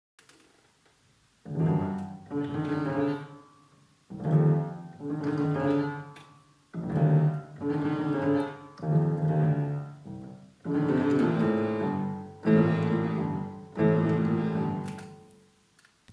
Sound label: fortepiano; Die nächtliche Heerschau; bb.-1-5; Performing Carl Loewe’s ballads